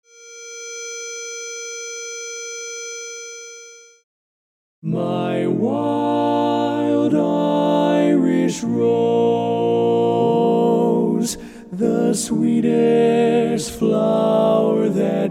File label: Lead, Learning Tracks; Barberpole Cat I